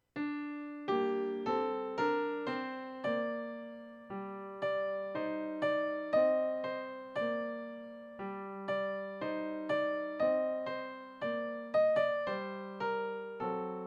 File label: Old French Song; Tchaikovsky